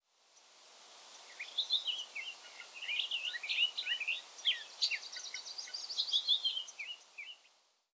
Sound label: birds; dota